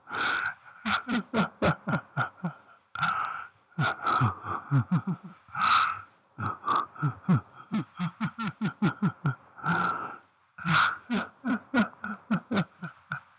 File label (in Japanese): warai; 男; フハハハ…フハハハ…; 更に危ないおじさん 左右に移動